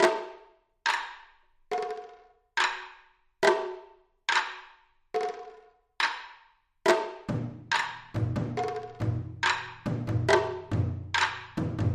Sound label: Double Japanese Drum